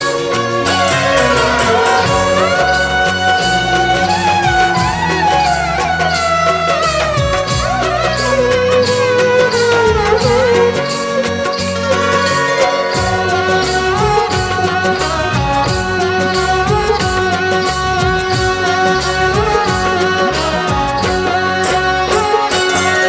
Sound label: nice superhit rajasthani song